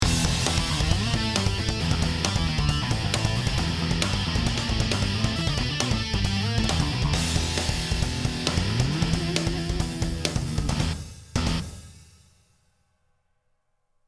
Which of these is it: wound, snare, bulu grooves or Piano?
snare